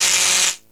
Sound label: Lightning Hit Rod